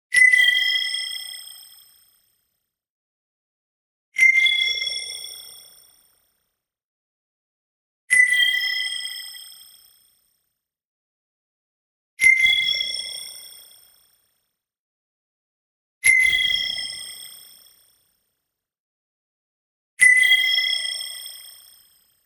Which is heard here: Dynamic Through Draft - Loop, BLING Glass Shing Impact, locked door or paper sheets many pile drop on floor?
BLING Glass Shing Impact